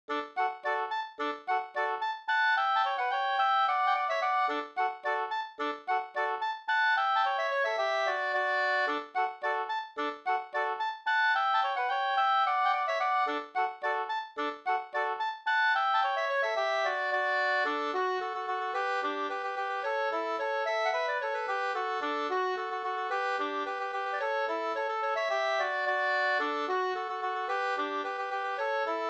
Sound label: polka, polketten; Ydrepolketten Og 2st